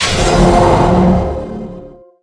LevelUp; Tribes2; ironsphererpg

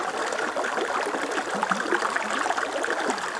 river, environment; t2-mapper

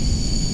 outrider boost; Tribes2

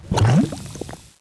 lava bloop; environment; Tribes2

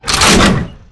weapon missilereload; weapons; Tribes2

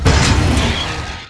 crash hard, vehicles; Tribes2

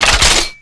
grenadelauncher activate, weapons; Tribes2

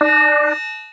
target waypoint; Tribes2

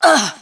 grunt, voice; Tribes2

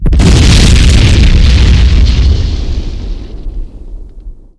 explosion

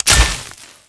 shocklance dryfire; weapons; Tribes2

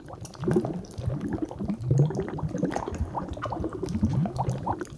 lava mellow, environment; Tribes2

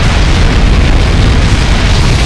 shrike boost, vehicles; Tribes2

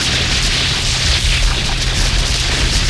ELF fire, weapons

